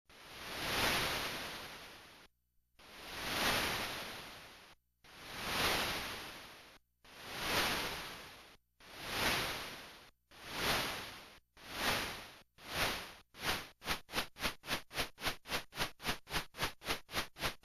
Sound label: steam engine